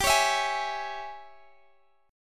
G sharp Major 7th Suspended 4th Sharp 5th, G

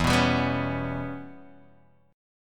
E Minor 6th Guitar Open F; Em6 strummed; 4,6,7,6,4,x; JGuitar

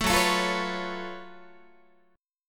F sharp Minor Major 11th, Guitar; strummed; JGuitar

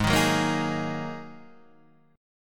G sharp 7th Suspended 2nd G sharp

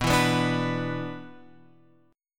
B-Minor Double Flat 5th; Bmbb5 Chord {7 7 9 7 X 7} strummed

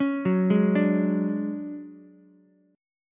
D Major 7th Flat 5th D down Ukulele; arpeggiated; JGuitar

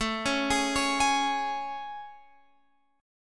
A Major 7th A, Guitar, Open F; AM7; arpeggiated

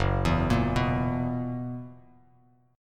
Gb 11th, Bass Standard; Gb11 arpeggiated; JGuitar

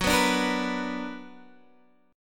B Minor Major 7th

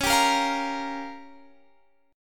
Db 6th Flat 5th, Guitar, Standard; Db6b5 strummed; JGuitar

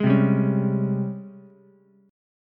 Ab Major 11th Ab Ukulele C6; AbM11 strummed; JGuitar

